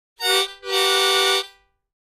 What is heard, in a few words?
Mouth-organ, Car/Truck Horn, Type 4 - High, In Two Voices; woodwinds harmonica